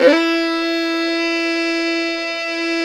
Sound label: violin E2, Balkanish Traditions